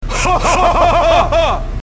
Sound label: hohoho